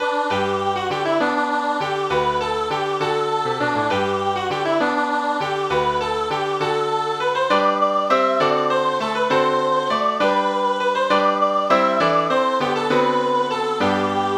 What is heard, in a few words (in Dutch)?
Oudkerstliedje